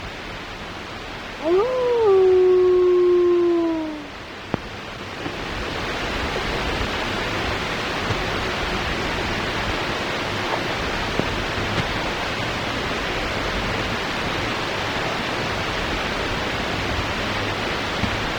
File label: Solitary Wolf Howling With Background Group; MAMMALS